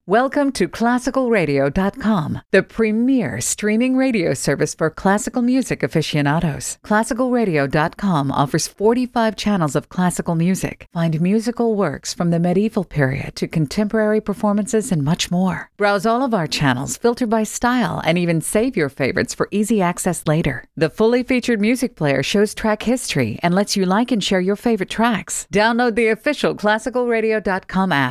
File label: Classical